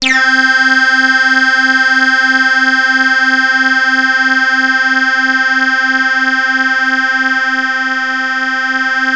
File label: Access Virus B; Synthworx